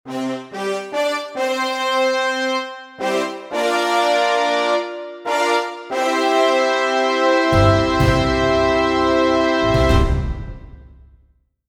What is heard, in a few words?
hit; cms weihnachtskalender 2023 freeware